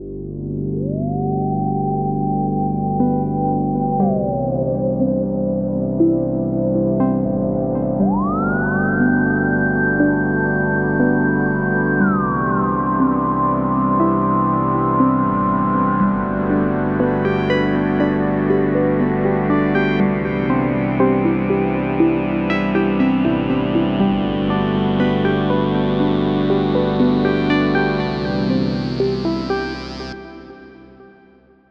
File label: Inner Harp